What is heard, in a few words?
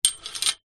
industrial metal chain attached to pole movement slide; zapsplat